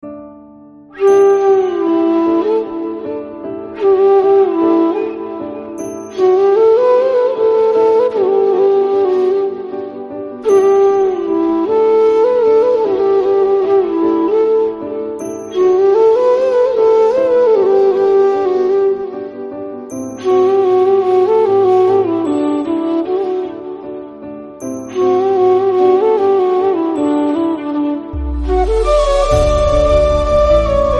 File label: flute